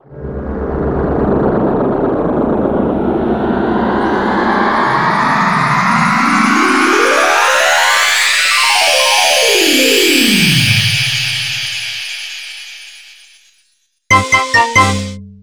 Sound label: Level Ending long with Bell; Nintendo 64; Yoshi's Story